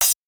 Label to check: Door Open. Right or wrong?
Wrong